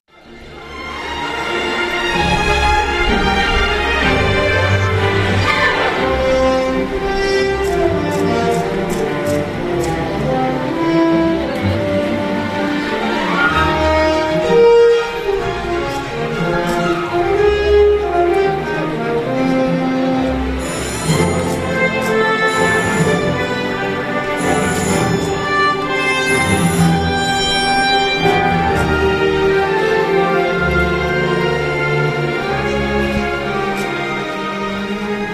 Closing Park Music; Epcot Entrance; Main Entrance

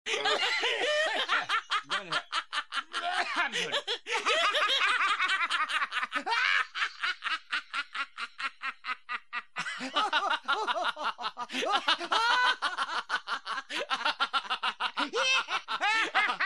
Laughs

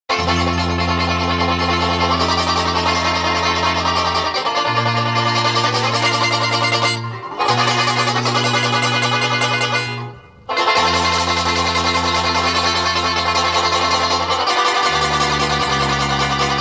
Banjo Swanee River

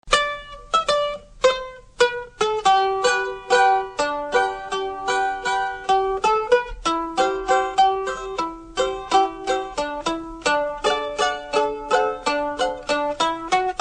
Fractured Folk Song

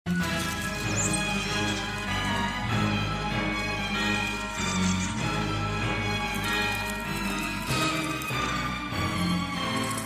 Music with Bats; Chickapin Hill

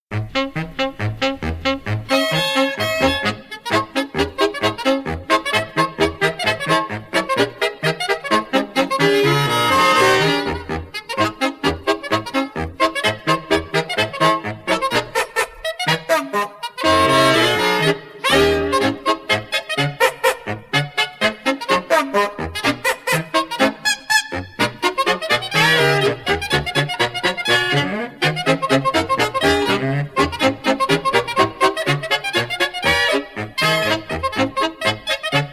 Saxophone Quartet

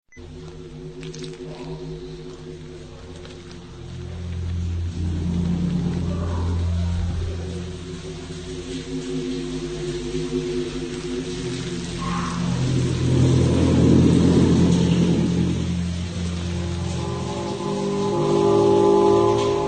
Cave Loop

